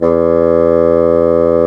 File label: E2, Bassoon Swel; Roland LCDP04 Orchestral Winds; WND Bassoons